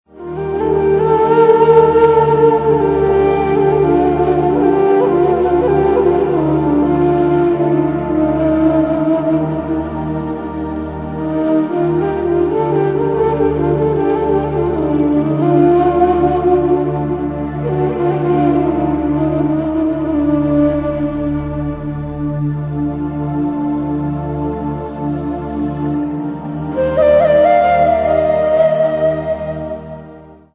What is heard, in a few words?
ringtone